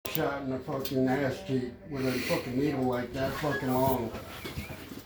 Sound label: shot in cheek